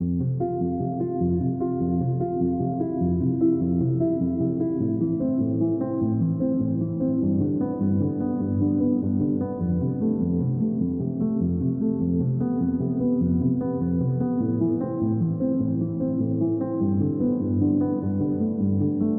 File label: cinematic moving sorrow 100bpm